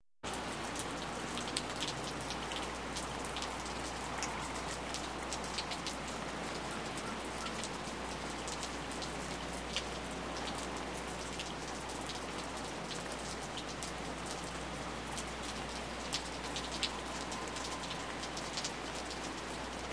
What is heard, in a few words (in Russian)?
Potoki vody posle dozhdya